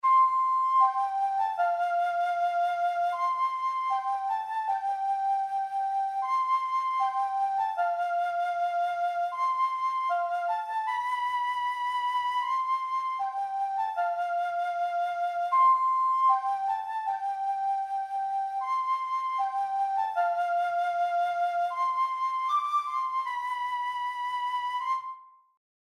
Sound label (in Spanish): Flauta